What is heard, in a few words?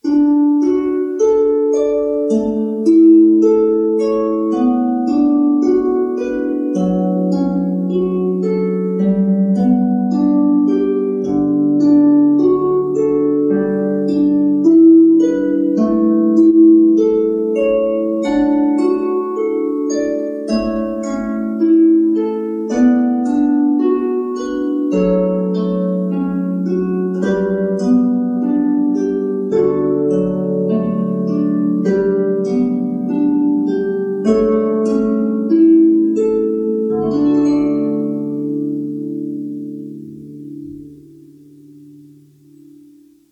Harpist; Omaha